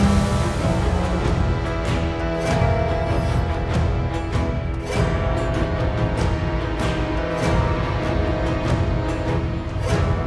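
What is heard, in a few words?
background music battle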